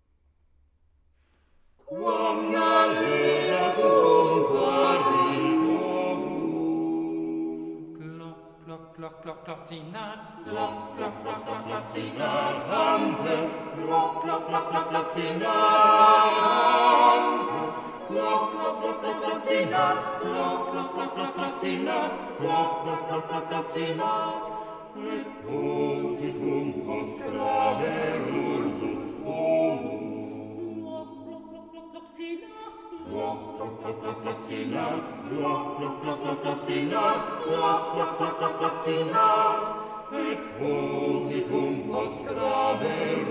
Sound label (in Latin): Gallus quam gallina